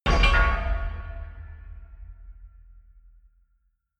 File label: metal horror clang, metall; industrie wirtschaft